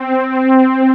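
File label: synth strings; cubic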